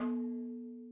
madalam notes R

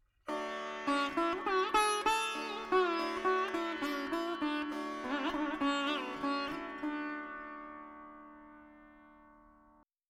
4 4 D 100 Dry, Melody Veena; Sample Banks

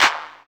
Clap, Percussion; Sample Banks

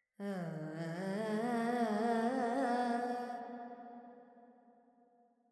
Verb Phrygian, Vocals, Carnatic Female, Alaps

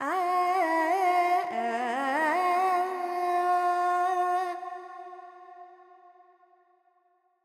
FX Dorian, Vocals, Carnatic Female, Alaps, 70 G

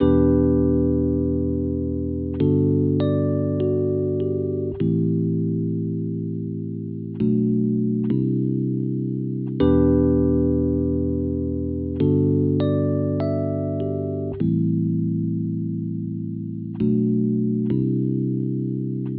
Electric Chords Em 100; Melody; Keyboards; Sample Banks

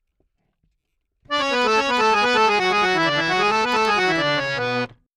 Harmonioum Melody Bm 128 Dry

